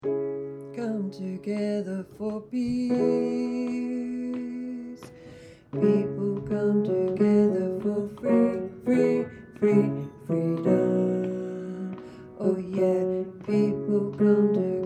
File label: tenor, voices